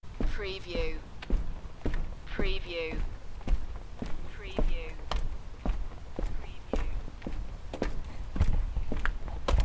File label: Footsteps over a wooden bridge; beanstalk audio